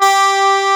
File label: BANDONEON